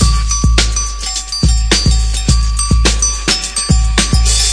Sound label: cowbell classic rap, drum loops and breaks, 106 bpm